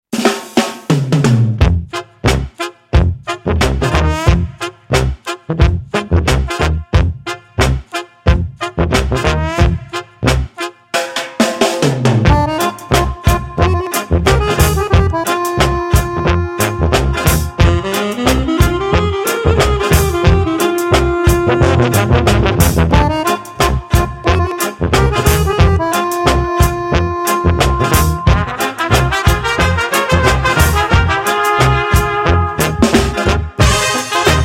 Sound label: Balkan Reggae